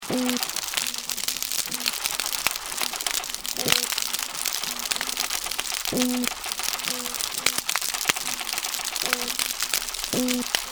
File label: Florida soundscape